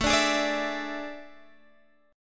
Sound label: A Major 7th Suspended 4th, Guitar, Half Step Down